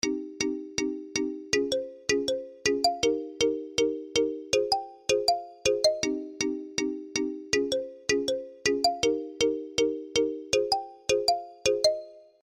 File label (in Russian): standartnoe sms na novom nokia asha 310